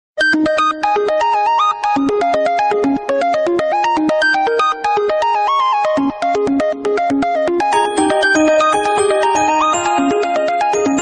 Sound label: iphone 4